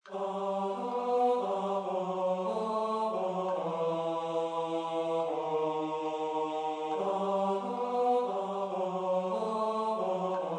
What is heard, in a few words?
ttbb; Вислухай Боже молитву